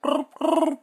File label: bulb bulb frog; SyrinScape; Syrinscape unplugged; Jungleplanet dinolaser battle